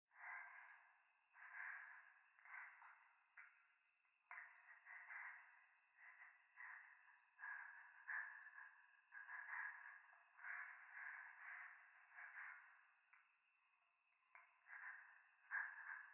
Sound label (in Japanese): 右耳快感吐息 山奥夜; 教会